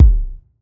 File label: Kick Sub Roomy